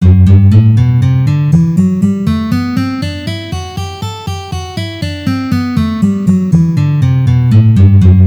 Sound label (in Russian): Gamma Sol mazhor pozitsiya